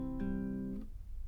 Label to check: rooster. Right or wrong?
Wrong